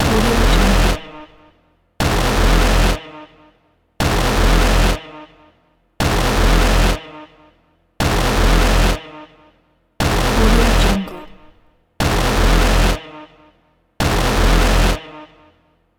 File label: siren low